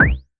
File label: TR909 kick, Roland SH-32; Drumset 04